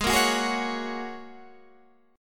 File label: Ab 7th Suspended 2nd Suspended 4th, Guitar, Half Step Down